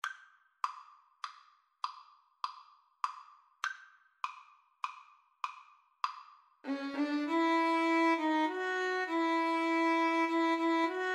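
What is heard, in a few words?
rugged cross violin guitar karaoke; Violin-Guitar Duet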